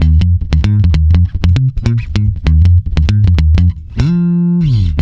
F, swing mute, bass; Spectrasonics; Bass Legends

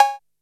KOMMERZDRUMS; Soundengine - CSR-000 The Art of Transwaves

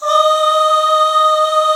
POP AHHS

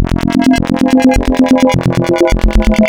Spectral, wavetables; Architecture 32

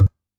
DJEMBE, Yamaha DD-65; PERC

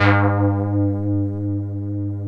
Korg PS3100 G 3; Back In Time Records; Korg Universe VOL-1

